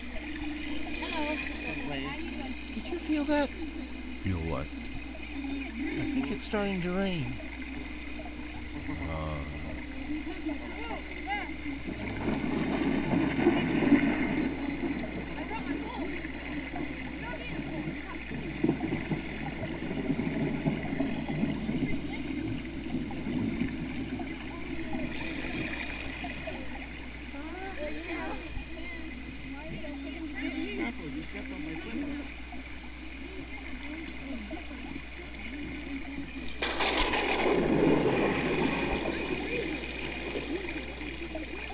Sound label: rain start